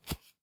brick hit soft

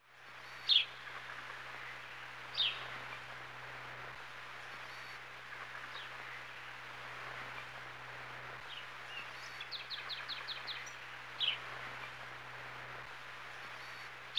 Ambience Archery; AR Games

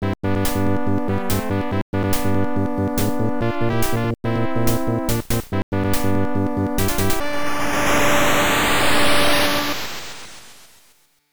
Area Clear; Go Ahead; Aleste; MSX2

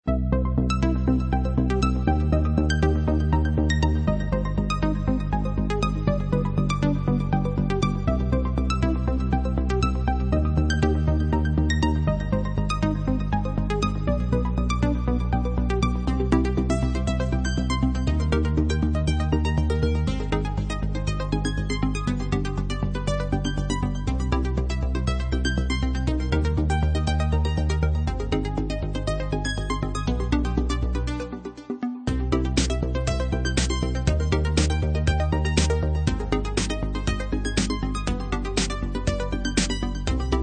Bedwar hall bgm; Blockman Go; Android

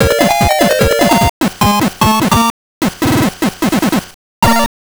Level Intro, Game Over; Rhino Rumble; GB

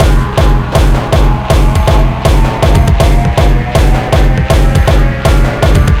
Beats Loop; Anger Foot Demo; Angerfoot Beats 04

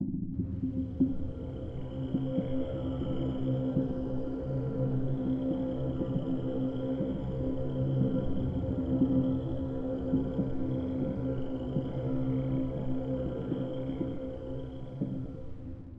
Ambient Car Noise; Brain; Radiant Crusade VR

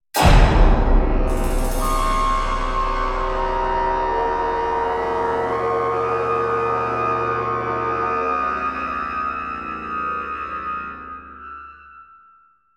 Salvation Stinger; Salvation Prophecy

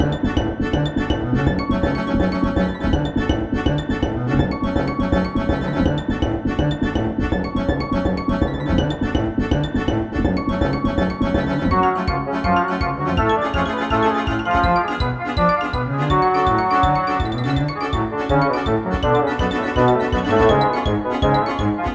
Woodguy Battle; heart chain kitty

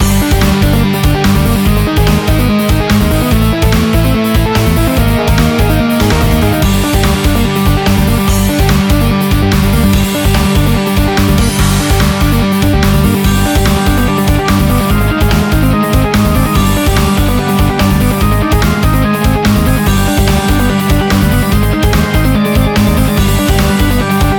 Boss Fight; Evoland 2 Original Soundtrack

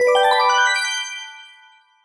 SFX - UI Start Race; Mario Kart 8

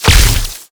Flash; Mighty Party; Android

